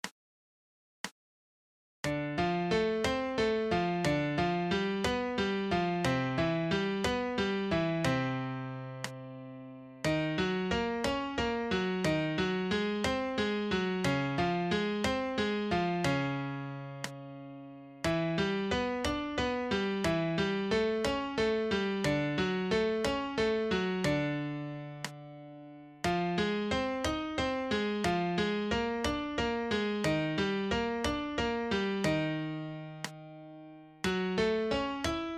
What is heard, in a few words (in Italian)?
fisarmonica accordion, pillole di jazz cadenze ii v i